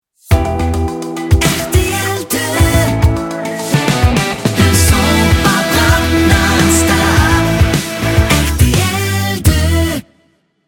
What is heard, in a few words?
Jingles; PURE Jingles; RTL 2